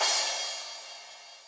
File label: crash, Zoom MRT-3B